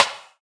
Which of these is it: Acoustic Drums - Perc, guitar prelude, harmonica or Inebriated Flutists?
Acoustic Drums - Perc